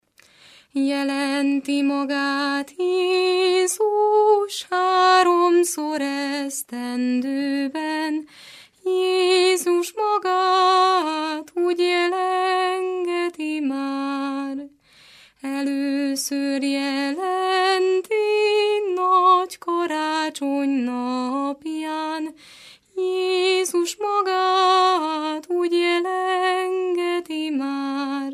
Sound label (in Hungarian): nepdal; jelenti magát Jézus